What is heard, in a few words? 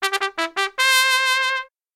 Jingle Goal